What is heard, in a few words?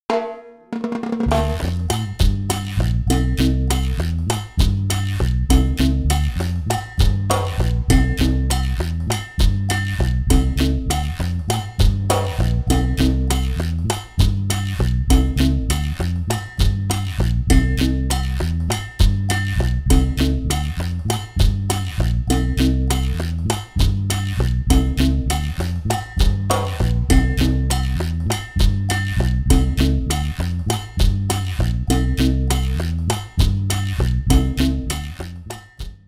Chachacha